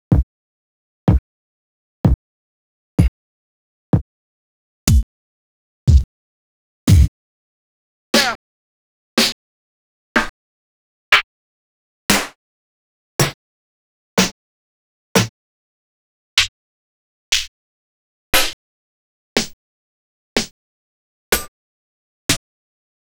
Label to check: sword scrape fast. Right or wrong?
Wrong